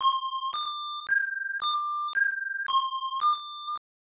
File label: Ocarina Melody; wonder boy in monster world; genesis